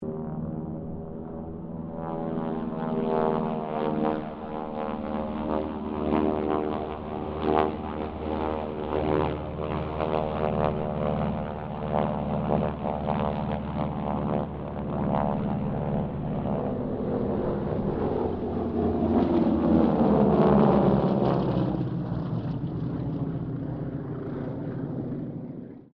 Harvard squadron 4 pass, stereo

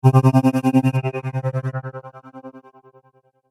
Trailer Accent; Trailer Sound Effects; sound design

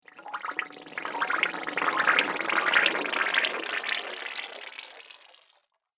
Water Bubbling Echoes, cartoon

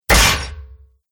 Heavy Sword Whacks Chainmail

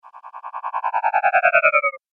Magic Power Down; Magic Sound Effects

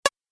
Multimedia Tiny Click; Click Sound Effects

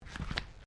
Footsteps Ceramic Tiling Step; Crocs; Footstep Foley; Footsteps (Sound Effects:Human)

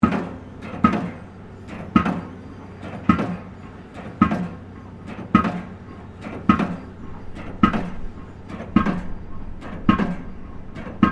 Construction Pile Driver Operating Close Loop; Construction site pile driver operating

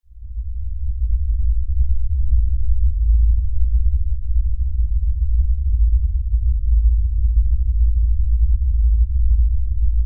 LFE Rumble, Lfe Rumble Low Bass; Sound Design

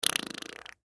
Human Mouth Fart; Mouth Fart Long Wet Descend Male Ten Yrs Boy; Blastwave; Humans

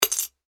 money piggy bank coin insert; Coin Inserted Into Piggy Bank; Money (Sound Effects:Objects); McKinney Sound

